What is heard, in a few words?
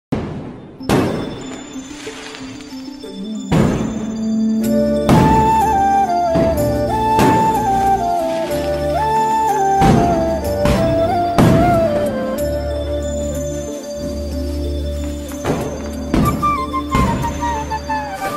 Diwali special ringtones BGM song background music